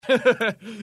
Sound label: laugh; assassinm4a1